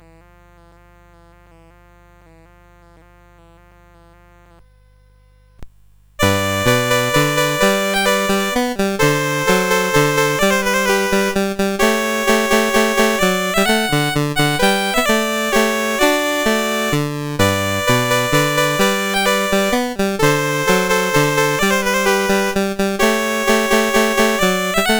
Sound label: Minuet, CSG8580